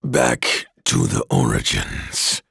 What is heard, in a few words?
death